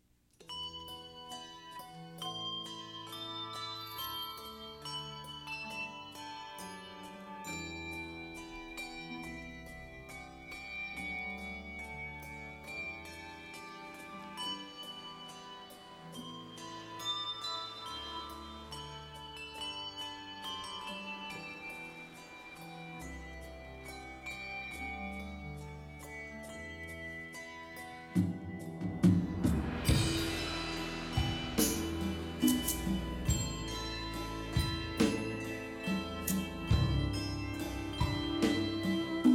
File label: Tango